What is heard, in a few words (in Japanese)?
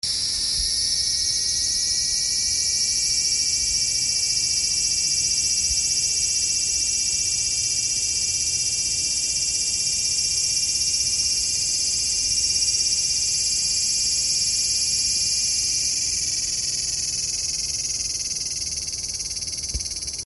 aburazemi, insect